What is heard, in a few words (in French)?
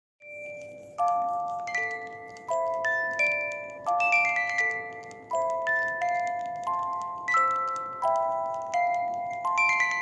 Valse